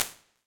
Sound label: small soft bounce; Sonniss GDC